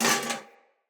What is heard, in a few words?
Foley Armour Step F; Enemy; Mockeries